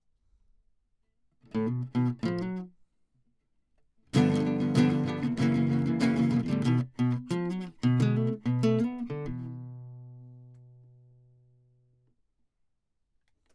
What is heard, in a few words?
Classic Guitar